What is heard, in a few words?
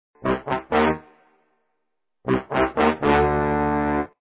Croc Warning; Feed Fribbit Colors